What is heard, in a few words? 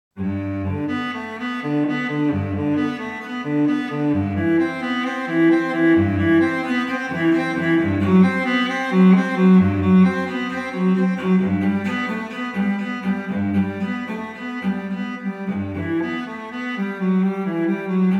CELLO SUITE NO. 1 PRELUDE BWV 1007